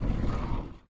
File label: obelisk turn